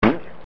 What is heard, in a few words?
Water Balloon, A Water Balloon Being Burst